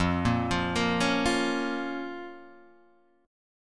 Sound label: F Suspended 4th Sharp 5th F, Guitar Half Step Down; Fsus4#5